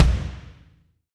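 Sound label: bass drum, Roland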